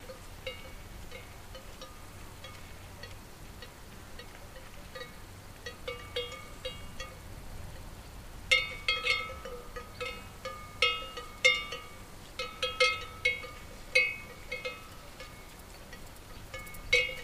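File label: Cow Cowbell Movement Wind; cows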